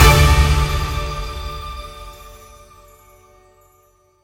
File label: big Win End; wild bazaar